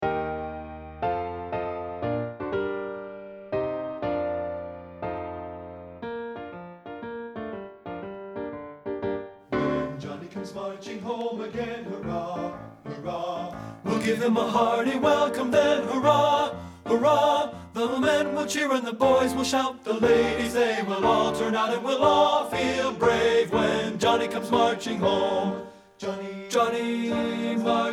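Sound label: tenor; men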